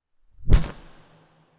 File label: Plastic Trashcan Slamming